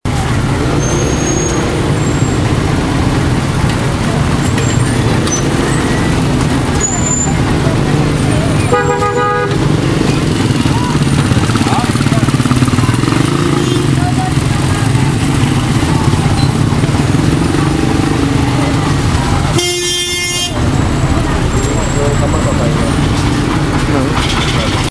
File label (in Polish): ulica; Chiny